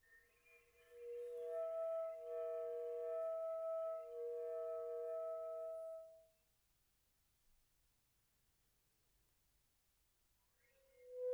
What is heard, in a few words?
Unstable Multiphonics